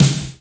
snare drum gate; SNAREDRUMS

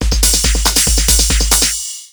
TECHNO BEATS 140

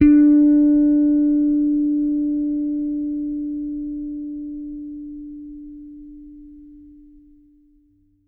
T-BIRD BASS FINGER D4; Bass Mace; DirectWave

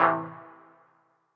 DEEPHOUSE ORGAN 125 A

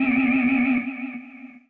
SPACE FX 140; SpaceSounds

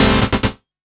KEY SHOT TEKNO 140 A; Techno2; Singleshots

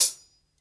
hihat, acoustic drum

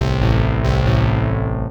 SNTH BASS ELCTR 140 A, Techno

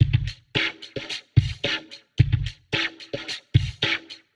110 Future Tapes, Warped Live Drums; Loops; Touch Loops; CosmicFunk

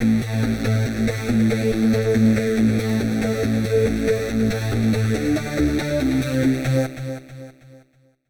HARD EXTRA 140, Hard Trance

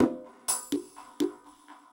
PERCUSSN ETHNO 125, Drum

